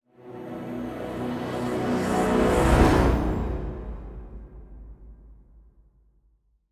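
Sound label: Music Ambient Transition Loading start; Forza Motorsport 6 Apex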